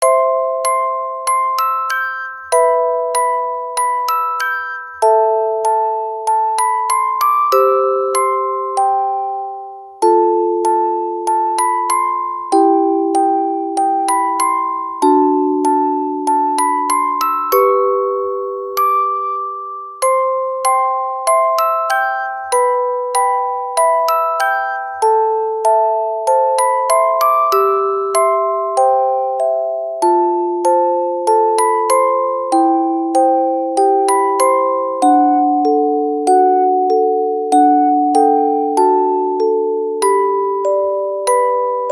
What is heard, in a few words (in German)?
orgel